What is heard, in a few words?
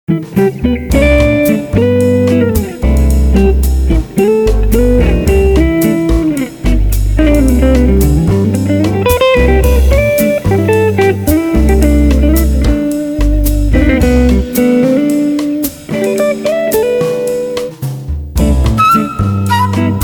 JAZZ MOOD